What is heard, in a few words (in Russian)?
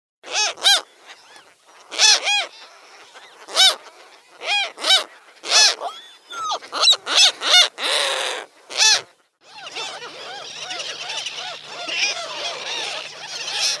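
reliktovaya chaika, relict gull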